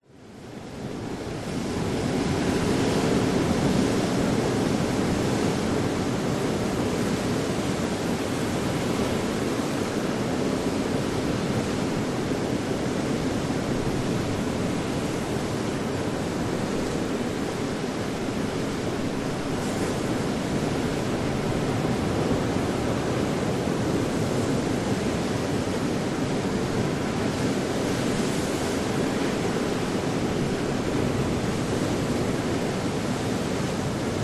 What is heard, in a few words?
nature ocean waves surf splash rocks gorge mouth top cliff; zapsplat